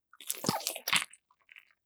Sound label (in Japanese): くちゅ音